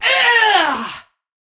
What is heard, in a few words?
Attack Effort; Warframe; Tau; Dax; Ninja